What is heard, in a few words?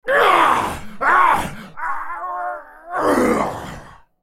dying; TryndamereDemonsword; en US